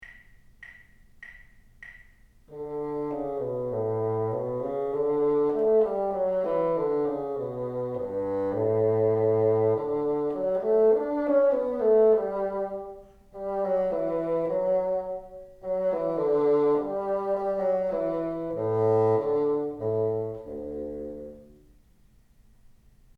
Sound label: teacher, bassoon